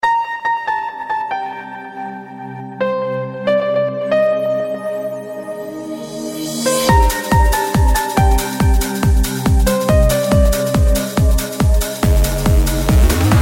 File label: piano trance